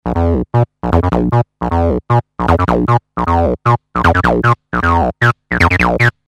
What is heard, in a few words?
303 slide